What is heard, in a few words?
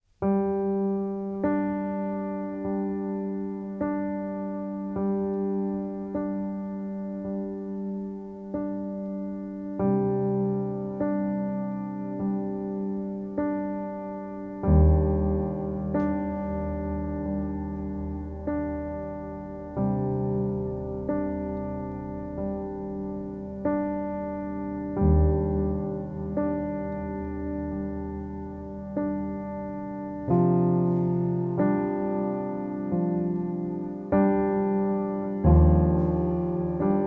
Underscore, Piano Noir; Narrative Mind